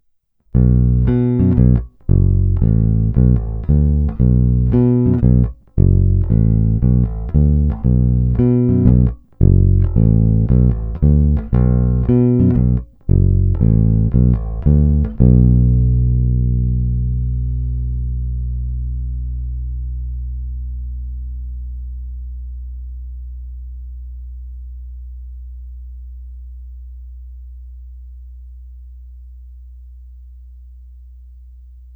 Snímač u krku (RW), Mike Lull V4, baskytara

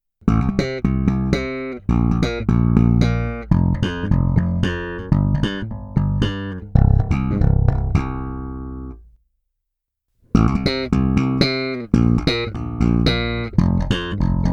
Oba snímače pasivně, Baskytara; Aleš Vychodil AVBS5

